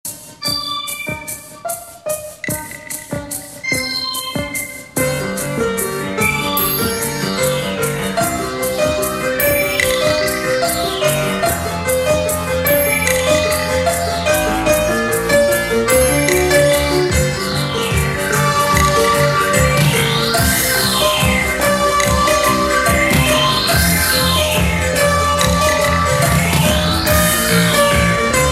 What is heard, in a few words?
Classic Mix Melody